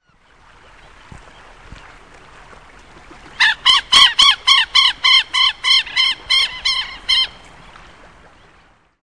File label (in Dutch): chileense kievit